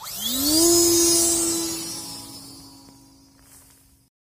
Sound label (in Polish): dron